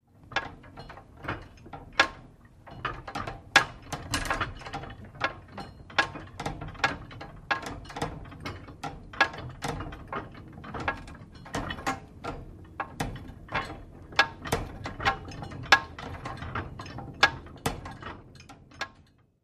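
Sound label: Metal Movement Various, crashes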